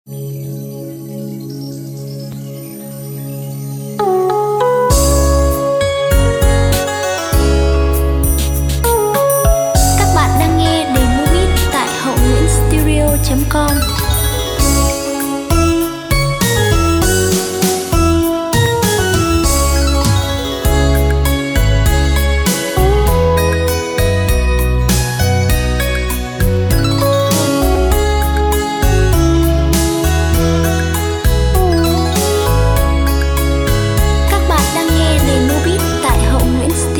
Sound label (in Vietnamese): Dua do beat